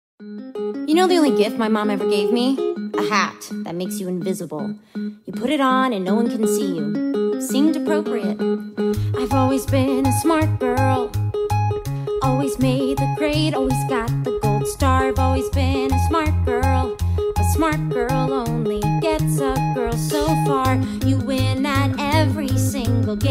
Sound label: Vocal; Percy Jackson and the Lightning Thief